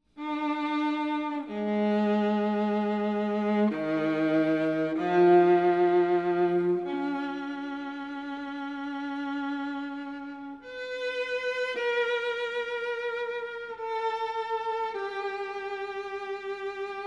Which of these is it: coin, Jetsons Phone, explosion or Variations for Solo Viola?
Variations for Solo Viola